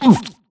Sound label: grunt hits; Titanfall 2